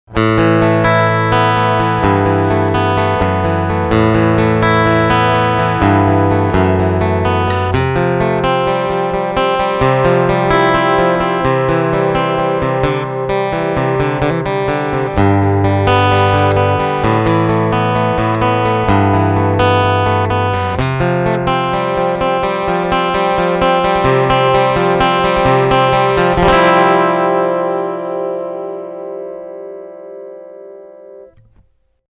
Picking Full EQ

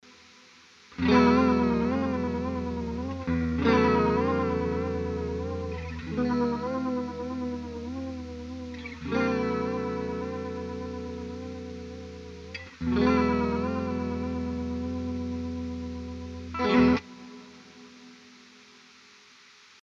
LoFi Vibe

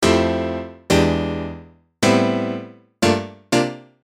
Piano

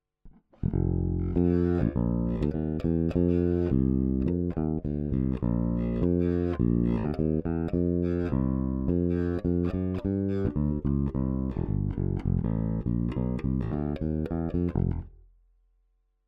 E Bass Big Amp

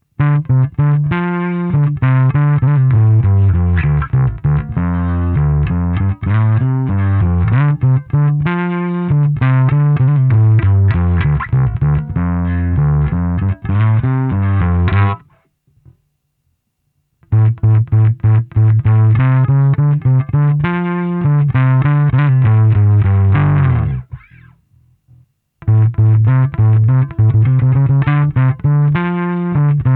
Fender Super Bassman

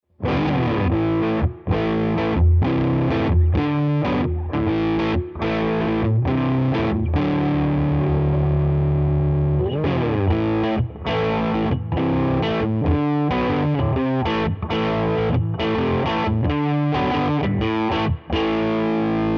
Riff paralell mid Res Cutoff

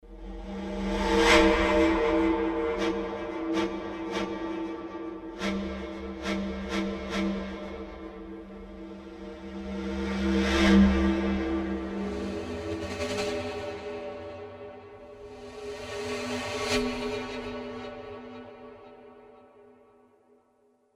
swishes tuned

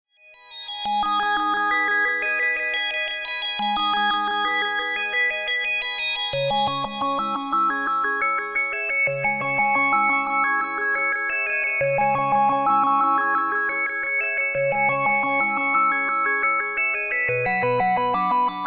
pan echo eq

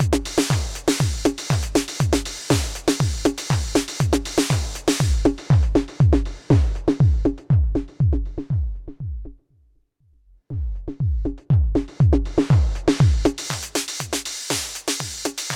Queen Of Pentacles Global Multimode Filter

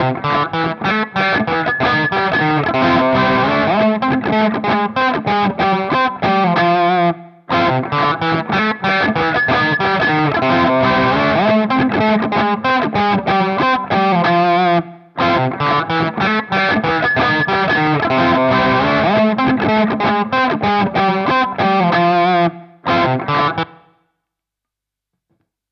Nux TS Comp; Audiovergleich Mooer Nux

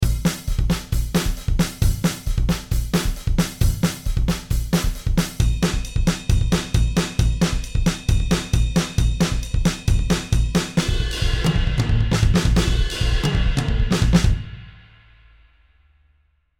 Octaplus Kit

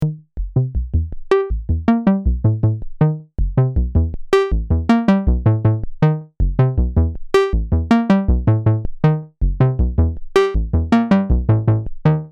OSC 1 2 SUB

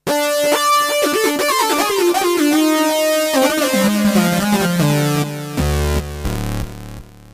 mono lead

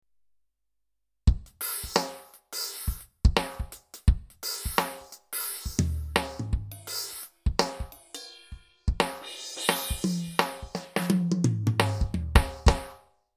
Octapad

